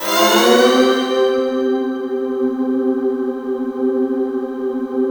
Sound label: RISE UP, STRATICUS; Spectrasonic Distorted Reality 1